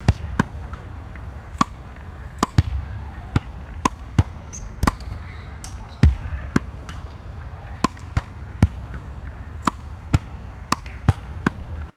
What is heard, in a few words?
tennis racket hitting ball volley